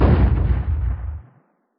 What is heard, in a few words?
flashbang explode distant; weapons; Counter Strike Model Pack; CS2